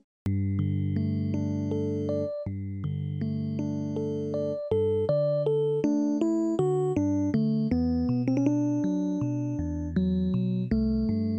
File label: solo piano